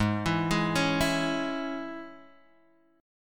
G sharp Suspended 4th Sharp 5th G sharp, Guitar Open G; x,1,2,1,2,2